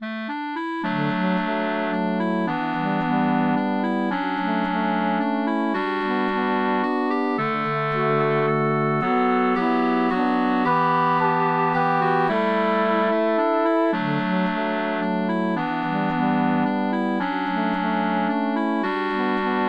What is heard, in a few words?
Waltz, Melody Harmony